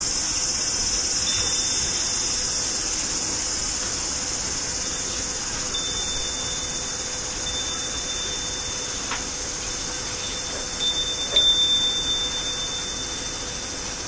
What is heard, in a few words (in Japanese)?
huurin